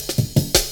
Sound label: cut, drum loops, 165.5; Zero-G Jungle Warfare 1, 2 & 3